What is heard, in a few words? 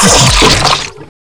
bite